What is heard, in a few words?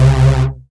SYN Chorus; Best Service Gigapack 1